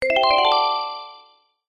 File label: multimedia alert musical warm finish complete success